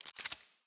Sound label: battle got item